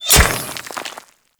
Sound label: Kunai Impact Dirt; Warframe; Hit Effects; weapons